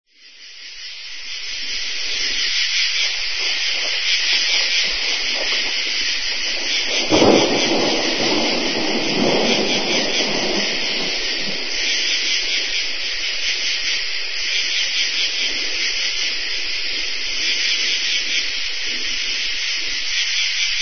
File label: RAINFORE